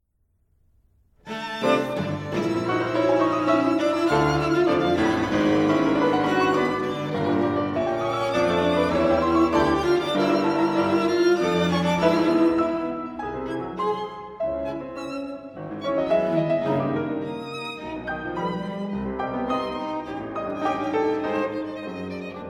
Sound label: Piano Trio